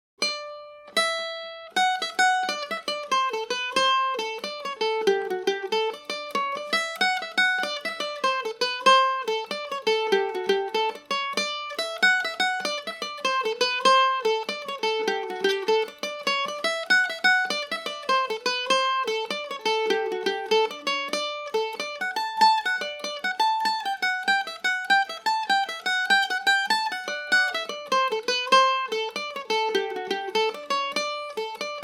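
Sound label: D mixolydian g and o, Irish mandolin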